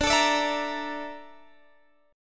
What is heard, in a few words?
Bb Major Sharp 11th, chord